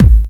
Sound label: fluffy kick drum sample A key; kick drum samples